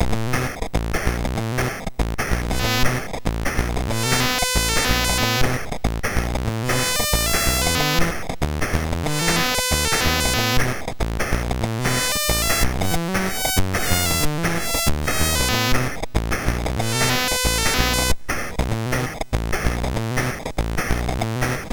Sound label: Music Demo, MOS6581; sid; Anon Music Demo BASIC